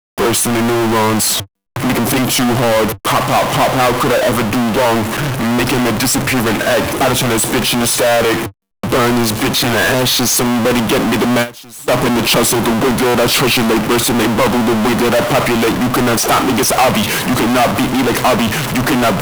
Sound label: vocals